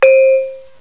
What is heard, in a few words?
saron, far east